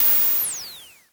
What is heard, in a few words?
Dual-Percussion-Synthesiser; Klone